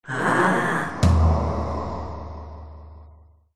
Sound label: moan; c-evo